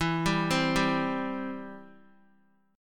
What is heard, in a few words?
E Minor Sharp 5th, Guitar, 4F,4A,5C,5G,5C,6E; x,7,7,5,7,x; Em#5 arpeggiated